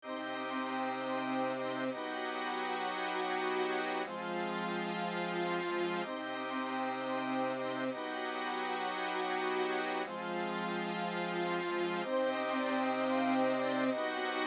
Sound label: Orchestral Assignment Piece